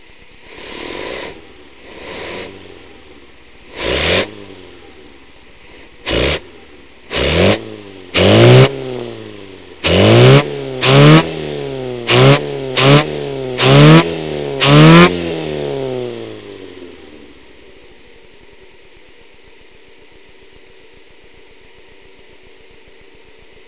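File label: advanced 5 after top dead centre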